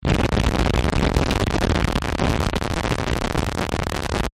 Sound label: Distortion Crackle; BlastWave